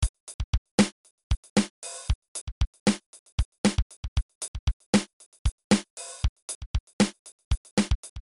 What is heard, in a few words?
funk, piano